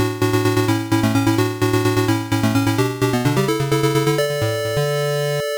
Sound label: Congratulations!; Hang-On; Master System